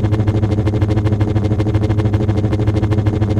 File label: saber spin loop